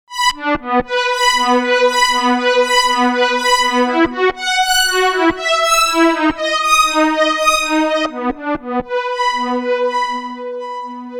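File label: Strings Ensemble Poly Arp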